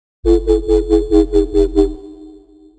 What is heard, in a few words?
bass gentle; widdim